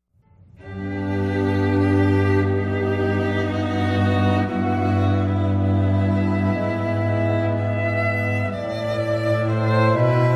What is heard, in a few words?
String Quartet; Panis Angelicus; Classical; Heritage String Quartet